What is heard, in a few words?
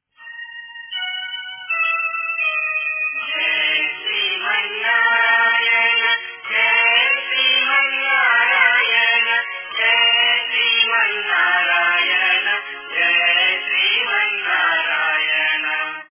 chanting